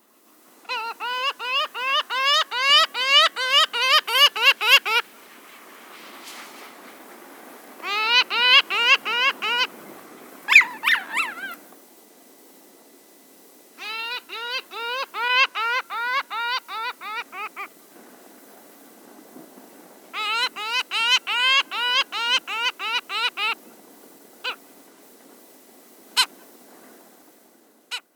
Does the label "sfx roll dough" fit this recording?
No